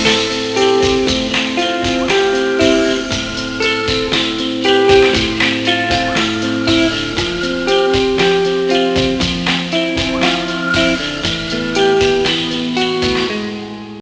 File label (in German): Klavier